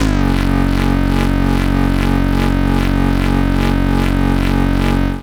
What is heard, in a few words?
Tear Out Bass F-0